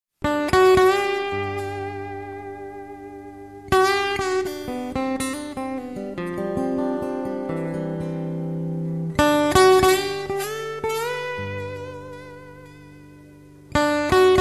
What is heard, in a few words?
walk blues